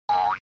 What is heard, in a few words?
SNES Action Jump